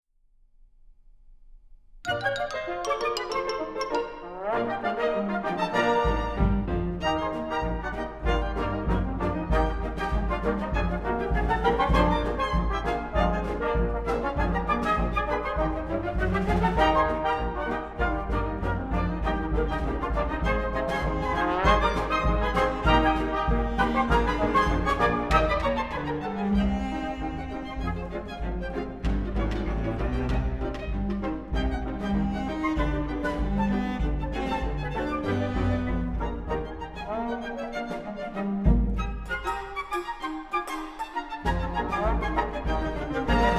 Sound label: Ragtime Orchestra Deuces Wild Rag; Paragon